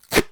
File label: detach; Kerbal Space Program; KIS